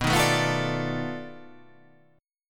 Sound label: B 7th Sharp 9th Flat 5th, down